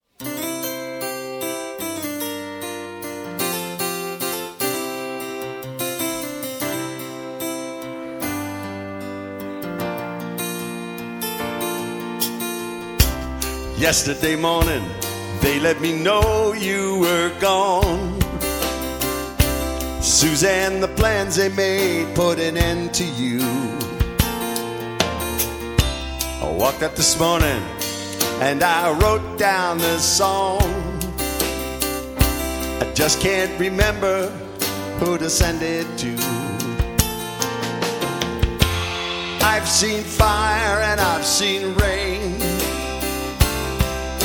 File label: Fire Rain